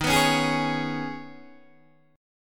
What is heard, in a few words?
Eb 7th Suspended 4th Sharp 5th, Guitar, Open D